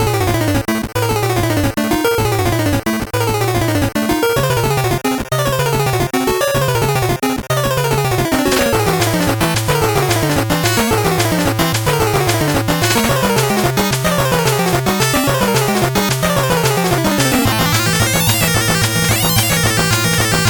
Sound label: 8bit bgm fast